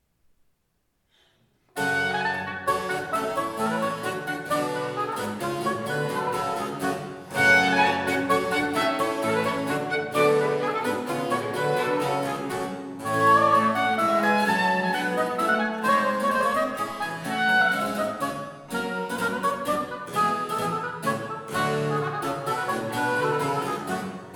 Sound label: Hornpipe, Alte Musik; Purcell; Ensemble für Alte Musik